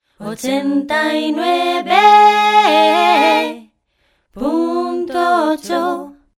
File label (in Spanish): cantante dial spanish, Dial 89 punto 8; cantante de anuncios